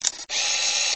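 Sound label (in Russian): перемотка камеры назад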